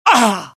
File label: death, pain; mohaa; dialogue